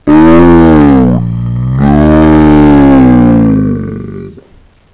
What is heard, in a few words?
error slow